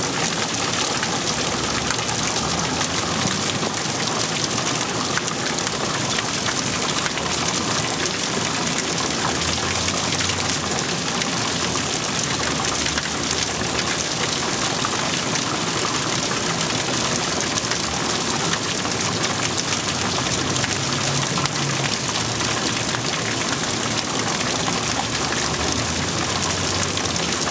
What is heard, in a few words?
rain, ambience sounds; Rain 2